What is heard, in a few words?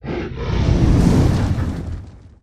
Dragon Roars Breaths